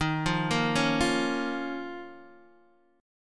Eb 7th Suspended 2nd, Guitar, Open D

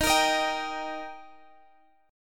D sharp Major, Guitar, Standard; strummed; x,x,13,15,16,15; JGuitar

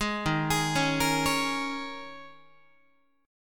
Eb 7th Suspended 4th Eb, Guitar; 11,11,11,11,14,12; 4A,4E,5A,5D,5G#,6C#; arpeggiated

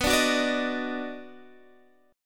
D sharp Minor Major 7th Sharp 5th

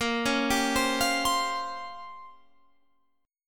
Bb Minor 6th Add 9th Bb down Guitar Open F; Bbm6add9 arpeggiated

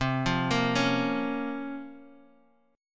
Bb Minor C, Bbm/C; arpeggiated; JGuitar

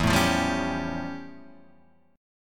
F Augmented Major 9th E

